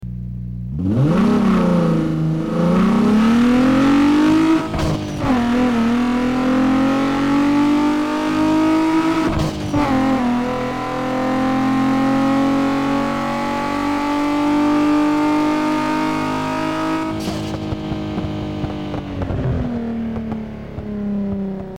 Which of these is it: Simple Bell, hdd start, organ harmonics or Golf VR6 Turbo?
Golf VR6 Turbo